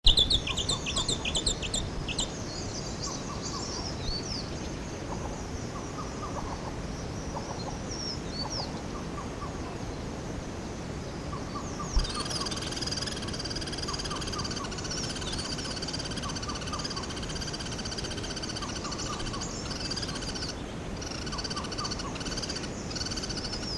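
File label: thamnornis warbler, Madagascar; Madagascar - 20th Oktober to 16th November 2003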